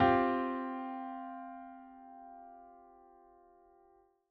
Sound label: triad C sus4